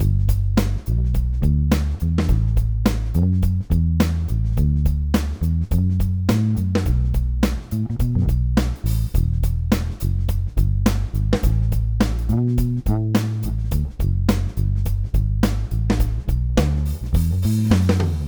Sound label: pbass di drums